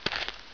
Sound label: gravel walk; hereticiidemo; player